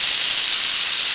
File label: fuse loop